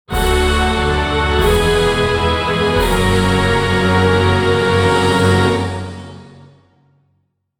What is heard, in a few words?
MUSIC LEVEL COMPLETE